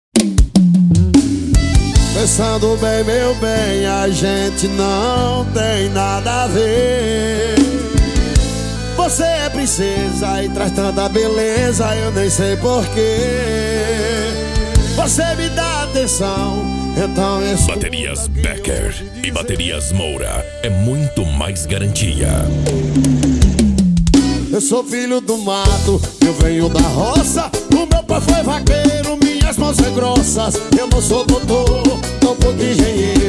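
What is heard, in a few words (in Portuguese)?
especial sertanejo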